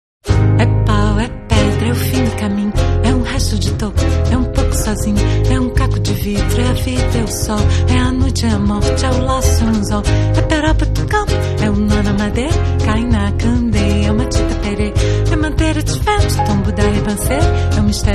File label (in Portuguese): harpa bossa